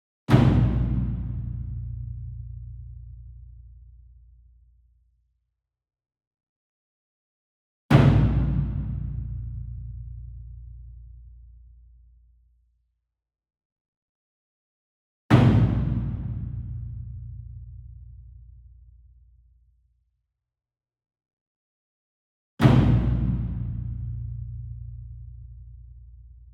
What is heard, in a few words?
SINGLE DRUM Gong Flam Hard Beater Far; boom library; Cinematic Strikes Construction Kit